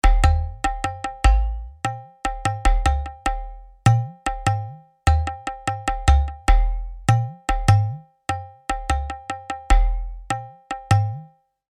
Kawai XD-5 Indian Percussion Single

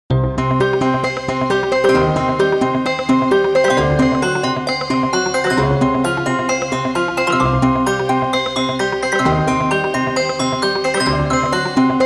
Nonlinear Labs C15 Bell; Arturia Emulator IIV; Arturia CZ V

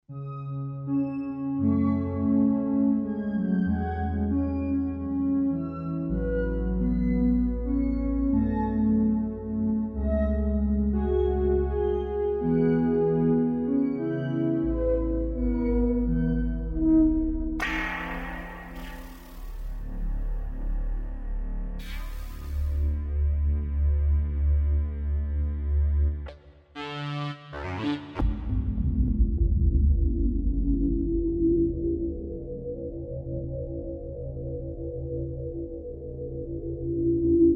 Unharmonic Playing Around

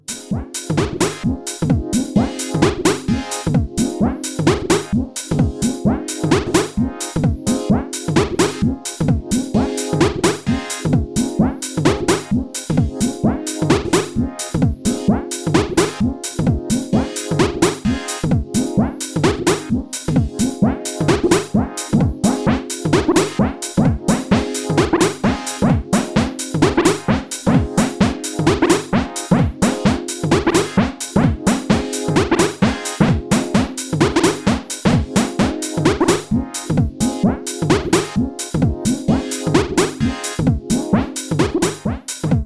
System 500

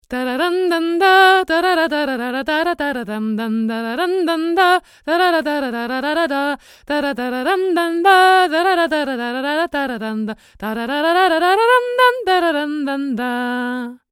Neumann TLM102 H2 near

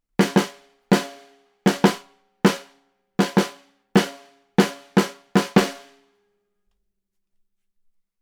Neumann km 184 Snare

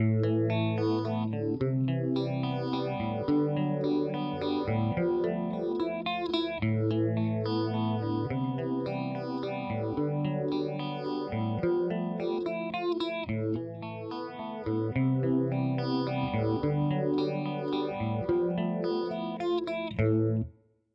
Zelzah 6 Stage Resonance off; Strymon

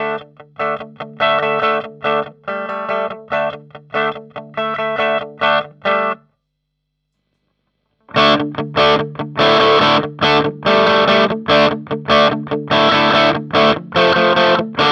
FX Loop Overdrive; Line 6 HX Effects

